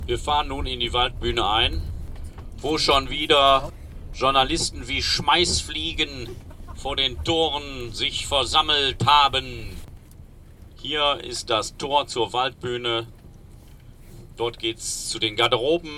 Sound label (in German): Fahrt Ton